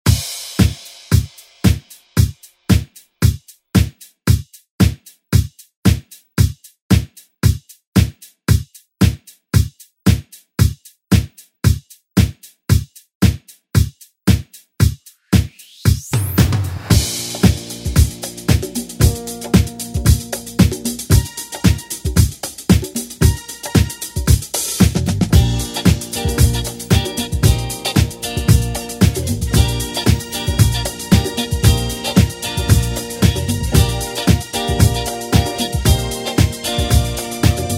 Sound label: 70s ReDrum; remixplanet